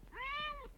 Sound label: Cat meow